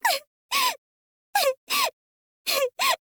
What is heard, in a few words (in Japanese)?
gusu hikku hikku, girl